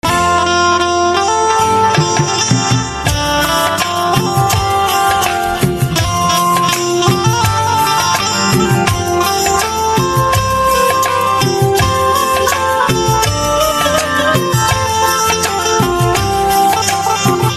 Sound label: Banjo Instrumental Ringtone